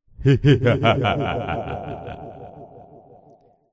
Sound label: Evil Laugh he he ha ha